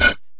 BREATH; East West; Global Sounds; D50 FXS

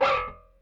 Rickenbacker Bass; Airwindows; Rick-Xtras

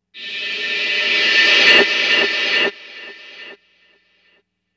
NRG Percussion, Trance Drums; Sonic Foundry (Sony Creative Software); Trance NRG VOL-1

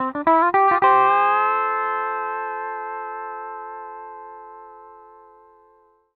105 L, PD LOOPS

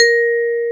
A#3, VIBRAPHONE; AKAI S-Series CD-ROM Sound Library VOL-7

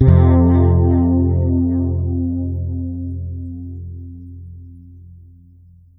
MAJ DOBL F, PEDULLA BASS; Masterbits CLIMAX 7 - Bass

